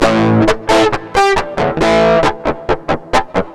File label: FX DIST; FX DISTO